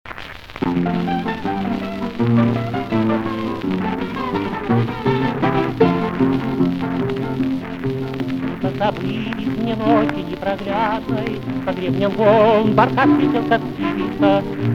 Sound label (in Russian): Песня моряка